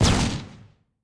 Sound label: sfx defeat explosions; Animation Throwdown